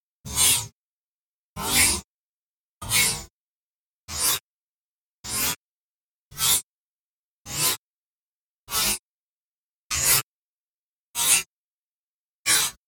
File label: Digital Wooshes Eleven